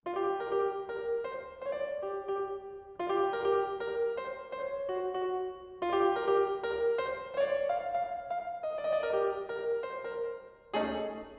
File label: jazz reverb